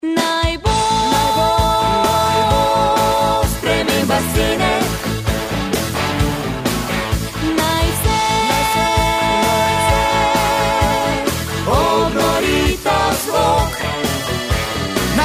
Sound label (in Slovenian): narodnozabavni rock